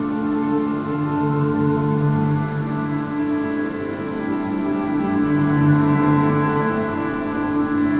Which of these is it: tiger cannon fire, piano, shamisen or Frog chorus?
piano